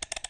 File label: zoom; outfly